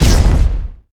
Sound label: Smoke Boom; Inotia 3; Rouge